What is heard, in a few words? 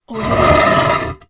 cement drag short; electronplanet